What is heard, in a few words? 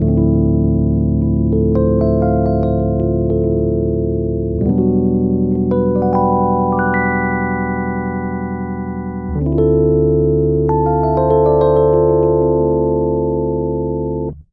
chill rhodes broken Dm9 Fm; KEYS ORGAN PIANO